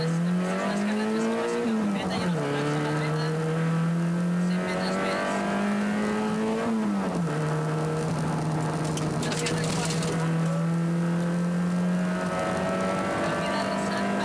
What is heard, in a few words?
motor